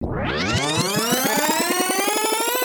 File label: Turntable Rewind